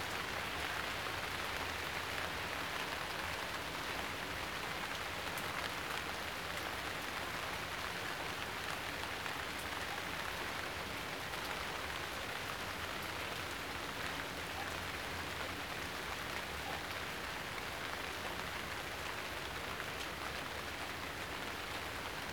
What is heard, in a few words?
outdoor rain light; teardown; ps5 windows xbox series xs